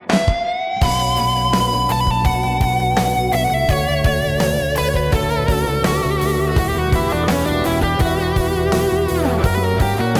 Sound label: rock